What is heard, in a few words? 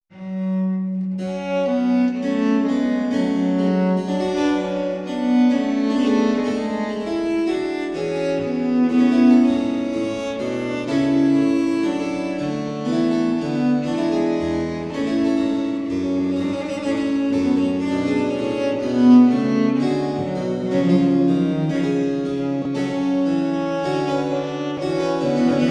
pipa